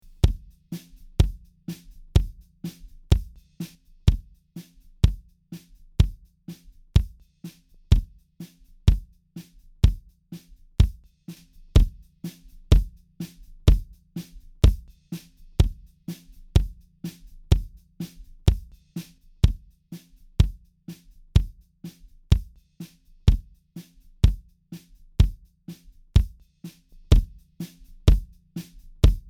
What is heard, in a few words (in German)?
Attack und Release hoeren bei der Kick